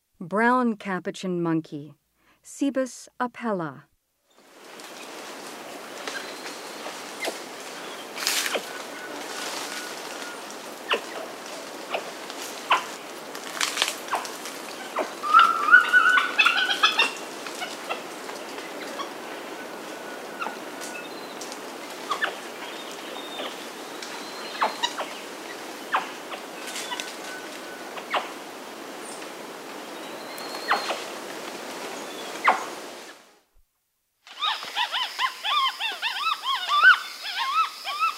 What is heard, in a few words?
Brown capuchin monkey; S. America; Rainforest; Primates of the Rainforest